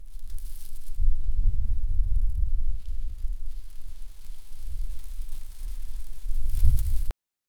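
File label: gentle wind through leaves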